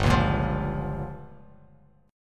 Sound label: G Minor 7th Flat 5th, Bass Standard; Gm7b5 strummed; JGuitar